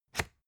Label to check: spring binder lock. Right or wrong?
Right